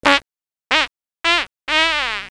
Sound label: fart